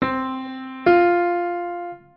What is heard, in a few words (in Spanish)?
tercera aumentada ascendente; intervalos; musica; melodia; terceras